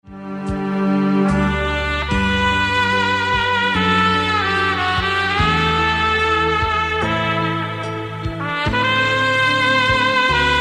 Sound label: Mobile ringtone